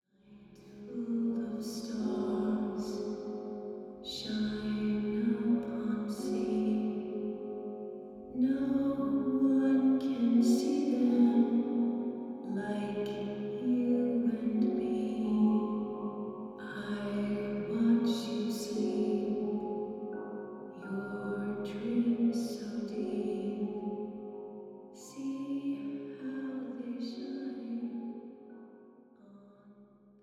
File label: Lullaby; Borrowed Lullaby; Call of Cthulhu 2018